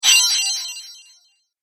Coin Magic, magic sound effects

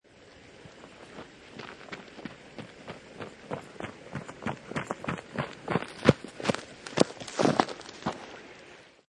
Run And Stop On Gravel Near River; Person comes running up towards microphone and stops. Forest setting with dirt surf

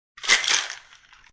Ice Shake, Quick shake of an ice shaker; Drink Mixing

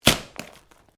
Branches Dead Snap; Material components for constructing more complex sounds; Snaps; Objects

